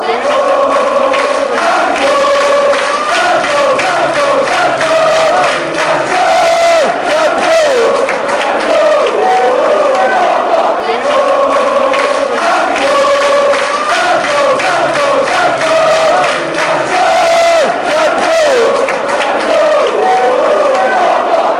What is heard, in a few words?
time go posh, fanchants